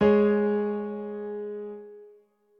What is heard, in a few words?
piano high